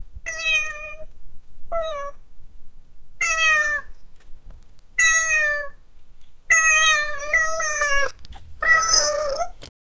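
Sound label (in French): chat maine coon qui miaule; domestic animal; domestic animals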